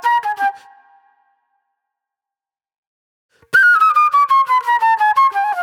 Wild Flute; Sonic Foundry (Sony Creative Software); Manhattan Flutes

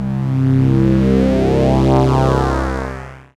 SYN VCF, SYN Analog; Sound & Vision; Gigapack I CD 2

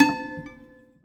Prepared Piano; Battery 3 Sample Kits

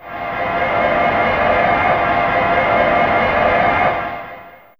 Shimmer C3, Fairlight CMI IIe; TremStr

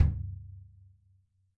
DW Jazz Kick, E-MU PX-7 drum machine

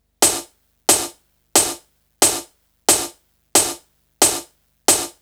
Snare Loop

